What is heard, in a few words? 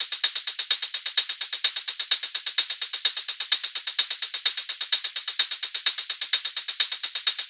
drum loop shaker